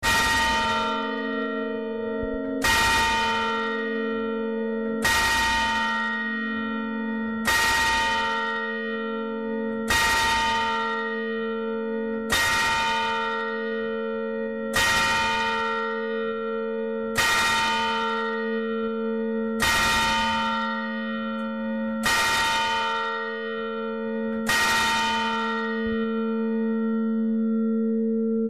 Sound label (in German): glocken